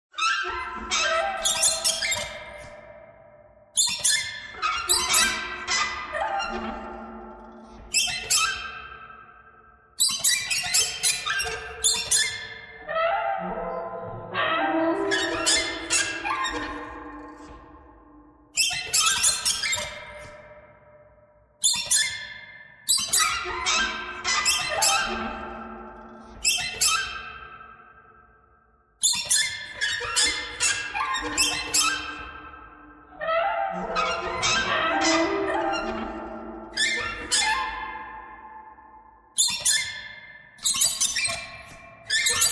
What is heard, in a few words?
birds birds thread simple